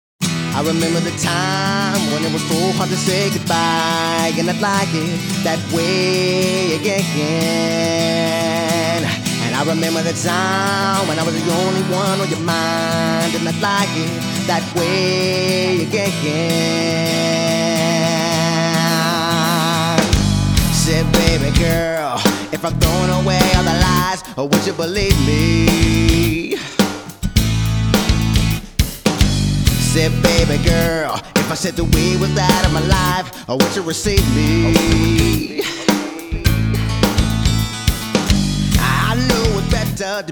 Vocals

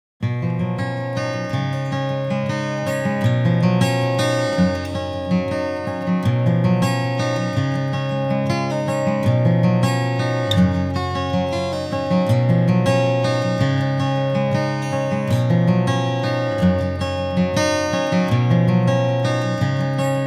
Acoustic Guitar

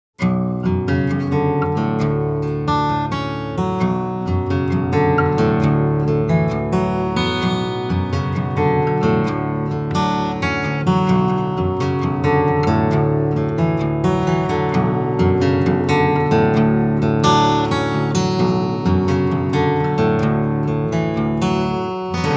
baritone

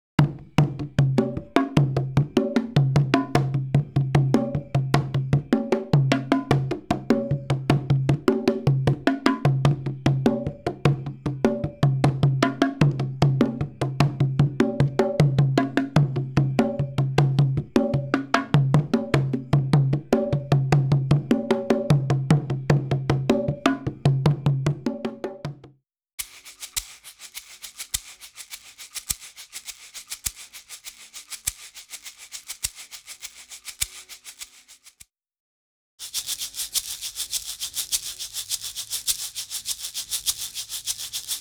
Drums Percussion

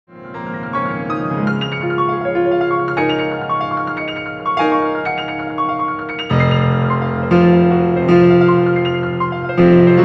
Piano Accordion

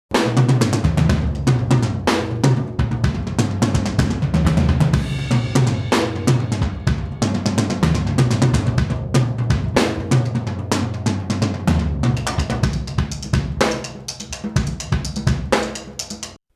Drums Isolated

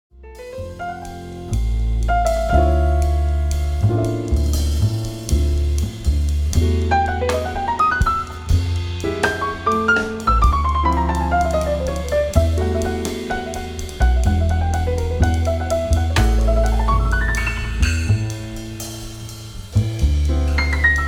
Piano Accordion B3

